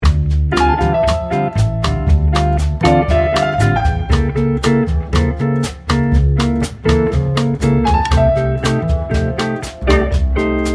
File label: latin jazz